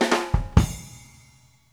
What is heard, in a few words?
FILL